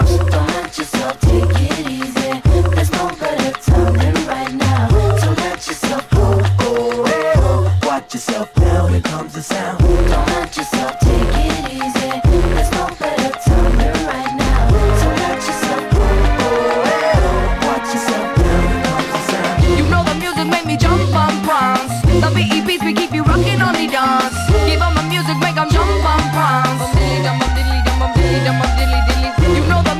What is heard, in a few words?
Audio-Technica AT-LP120-USB Turntable